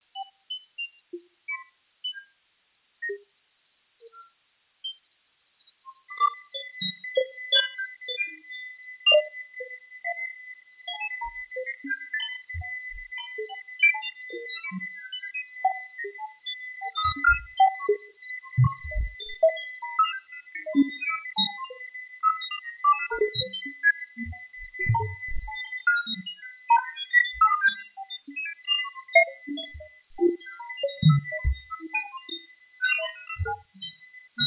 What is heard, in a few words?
fugue